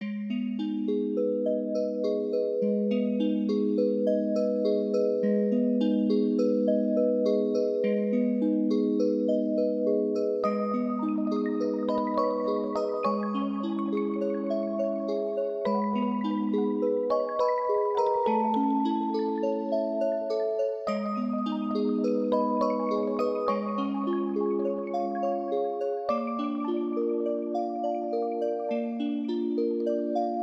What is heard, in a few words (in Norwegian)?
caves med kor - rain